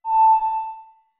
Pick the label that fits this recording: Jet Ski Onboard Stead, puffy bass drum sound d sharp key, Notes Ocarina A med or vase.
Notes Ocarina A med